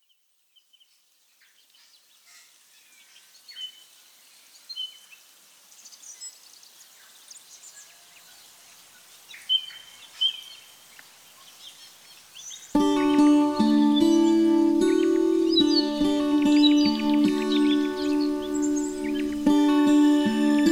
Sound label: ambiance melody